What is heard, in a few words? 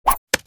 Throwing a knife short hit stabbing